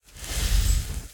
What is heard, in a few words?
lock it chain flash; netent; narcos